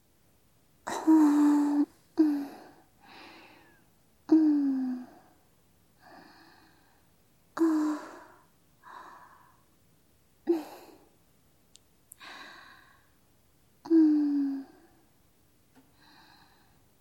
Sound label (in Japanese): 吐息 ループ ショート; みみあね。 ～世界で1番弟クンを甘やかすお姉ちゃん～; 囁き・添い寝・立体音響; 効果音あり